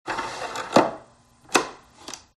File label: rope vacuum cleaner; fox audio